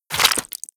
debris flesh, bullet impact debris

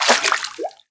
fs fm water, footsteps; Portal 2

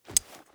flip, knife; weapons; Counter Strike Model Pack; CS2; knife ursus

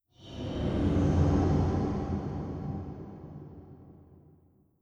vox wet, strider, combat, idle; creatures

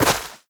step, footsteps player grass

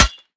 pottery, bullet impacts; physics; Half Life Model Pack

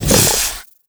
grunt soft, headcrab black; creatures; vox

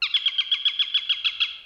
eagle; ambient; anubis

